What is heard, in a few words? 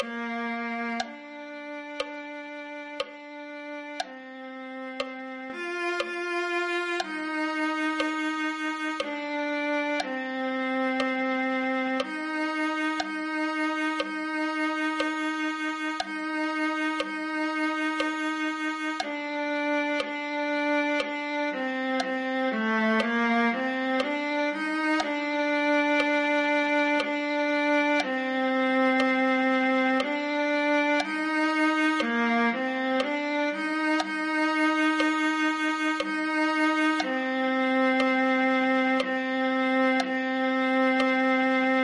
viola